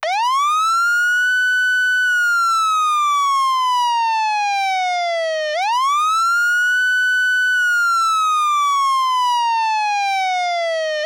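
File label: Federal Signal Omega 90 Manual, siren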